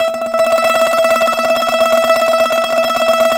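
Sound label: TZU TREM E 4, tzouras tremolo; Soundscan vol.53; Mediteranean Traditions; AKAI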